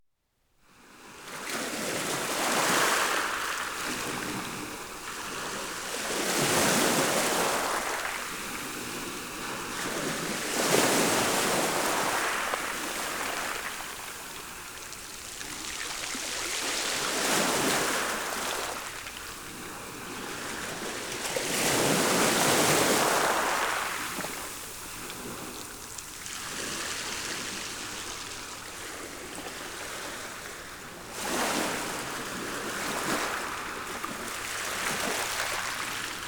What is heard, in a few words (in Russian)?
morskih voln pesnya